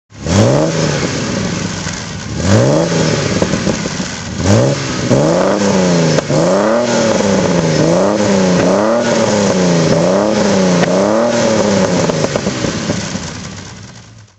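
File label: triumph tr6 pi throttle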